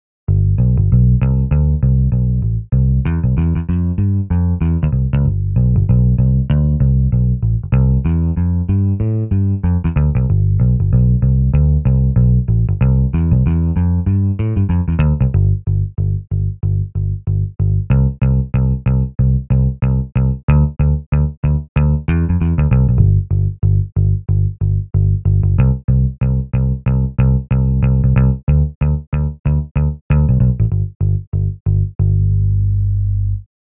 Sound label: phrases recorded with the Electric Bass played with my MIDI guitar; Realsamples